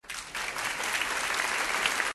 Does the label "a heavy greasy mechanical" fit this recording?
No